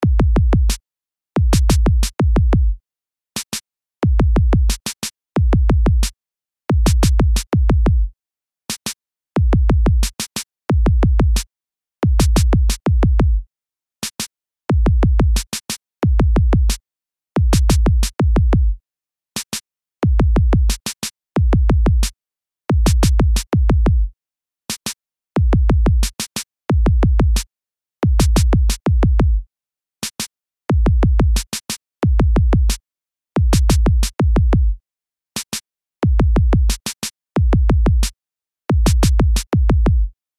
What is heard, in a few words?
beat